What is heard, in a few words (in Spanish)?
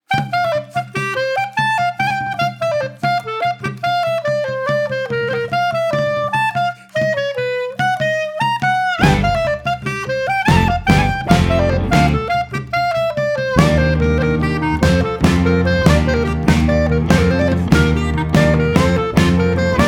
Melodia para silbar, clarinet